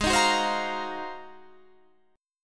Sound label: G sharp 13th, Guitar, Standard; strummed; JGuitar